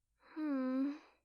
"hmm"; هوم

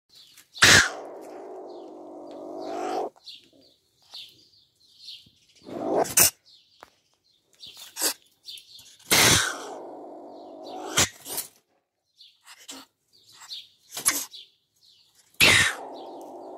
Martens; سمور

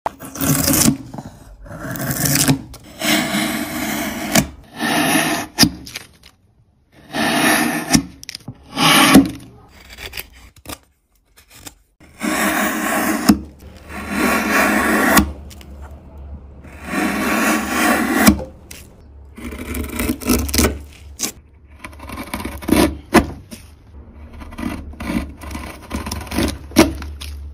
carpenter, صدای نجاری

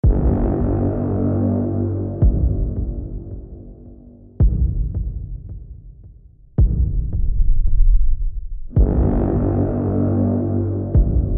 Atmospheric music

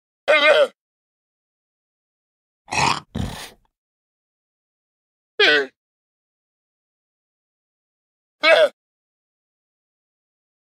Minecraft llama

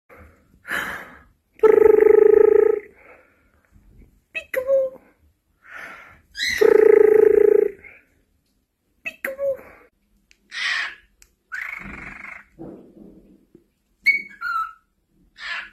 Cockatiel; عروس هلندی

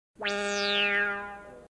Disappointment

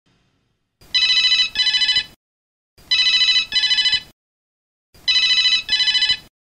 Siemens

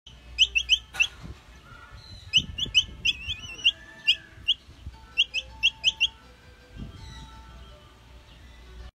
capybara

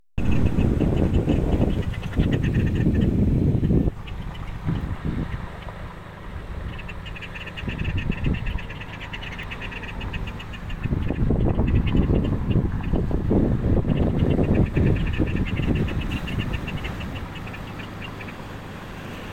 Inca tern

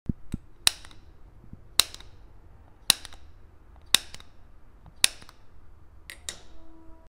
gas lighter; فندک گازی

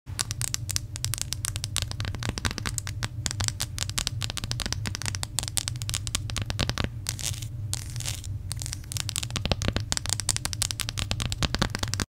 ASMR long nails

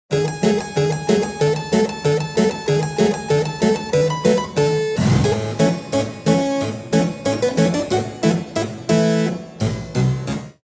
Harpsichord

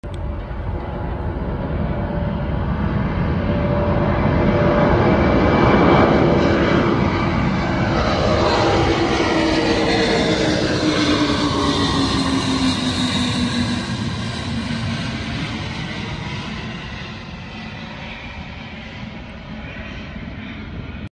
Antonov-An-124, آنتونوف 124

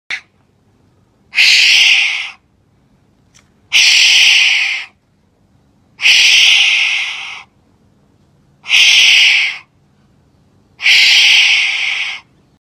Wombats; وامبت

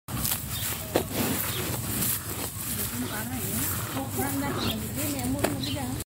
Cow eating grass; علف خوردن گاو

